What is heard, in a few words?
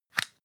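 floss open compartment hard, bathroom; haus-garten; badezimmer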